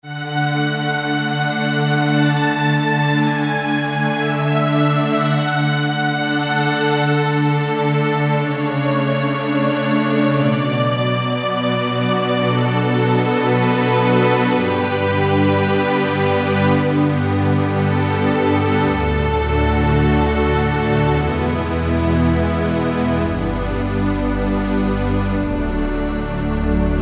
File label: Sweet, Yamaha TG500